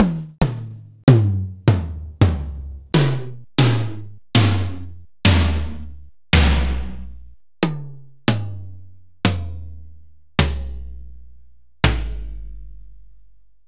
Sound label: Yamaha SU700 Toms